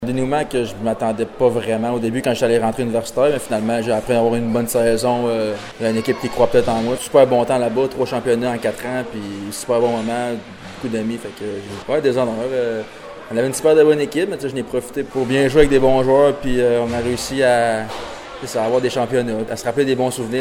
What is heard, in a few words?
CLAP